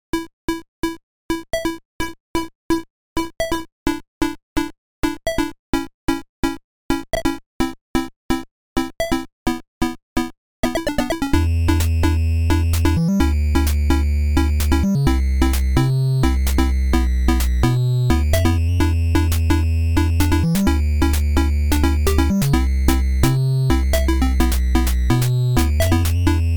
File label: famicom dance system; weeklybeats 2026